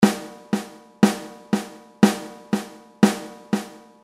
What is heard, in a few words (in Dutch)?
Achtste Noot Snaredrum